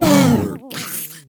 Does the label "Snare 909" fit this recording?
No